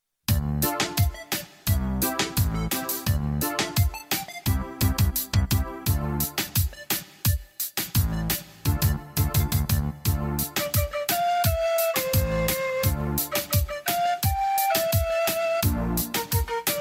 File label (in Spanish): Reggaeton, ritmos